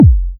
soft hit whistle

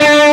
Left-hand vibrato; osu

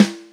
drum hit clap

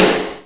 Clap Air Dart Snare + Splash

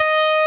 Arp. 8

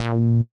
A#3 bass

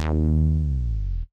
D#3 bass alt

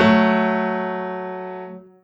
Two F# A3; osu

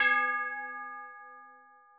G#5 bell

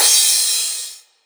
soft hitfinish; osu

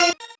normal hit normal

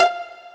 Pizzicato; osu

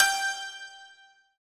Santoor G4

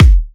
normal hit whistle

